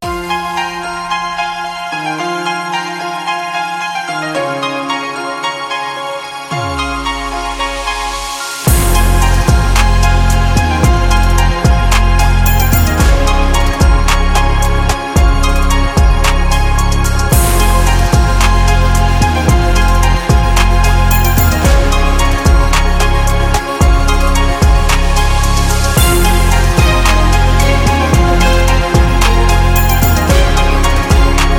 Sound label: Dramatic Trap